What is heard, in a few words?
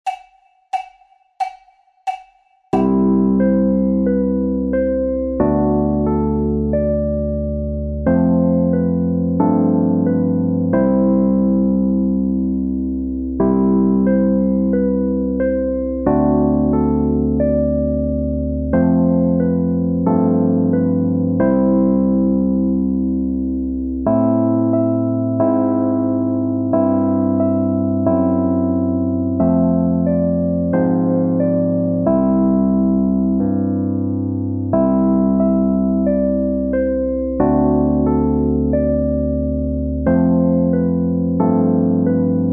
PIANO PLAYBACK